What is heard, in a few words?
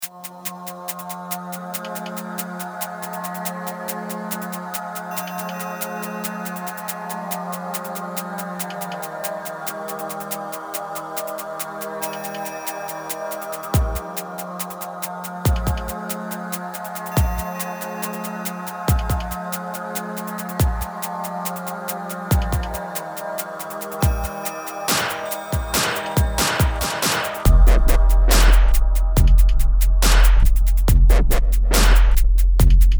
Dubstep; Massive; Forgotten Dub